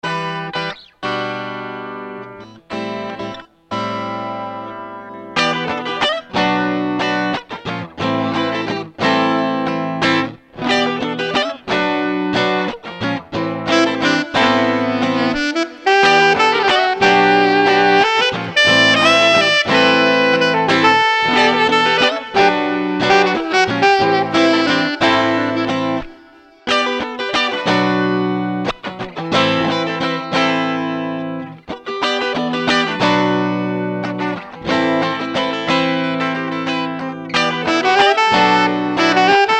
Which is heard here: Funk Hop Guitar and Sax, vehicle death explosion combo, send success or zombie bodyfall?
Funk Hop Guitar and Sax